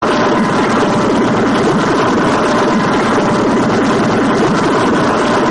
freeze ray sound effect

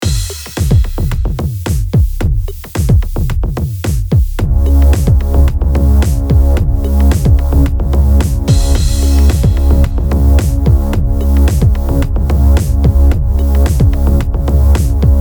complex beat

